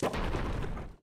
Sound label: Drill Dig Start; enemies